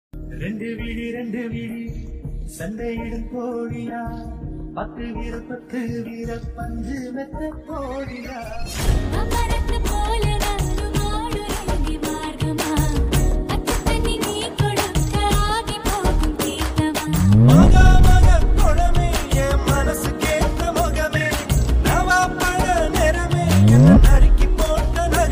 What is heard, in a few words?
tamil ringtone